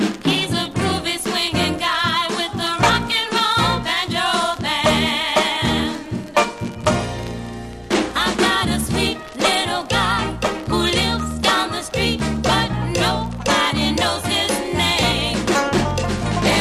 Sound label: ROCK AND ROLL BANJO BAND; 60s-soul